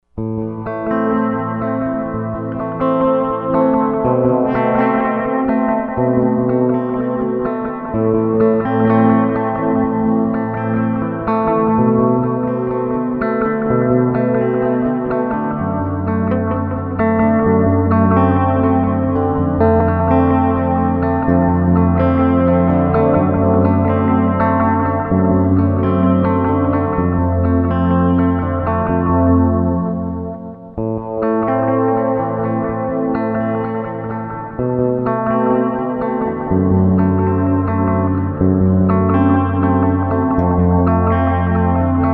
Chorus at 10 o clock Delay at 10 o clock

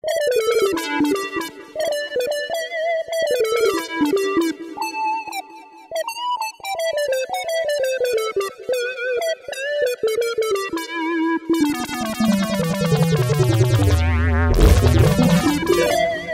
Mini LEAD

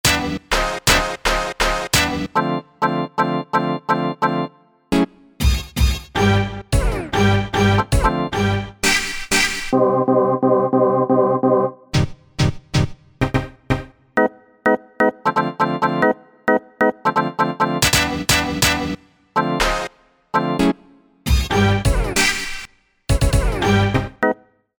Dance Hits, E-mu Proteus VX

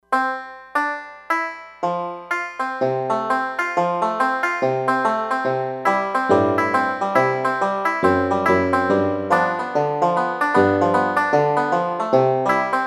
Banjo, E-mu Proteus-3; Factory patches

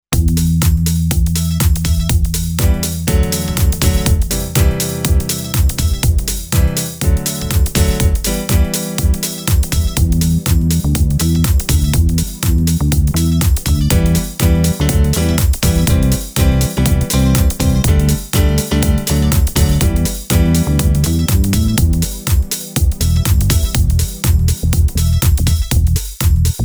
US Garage House

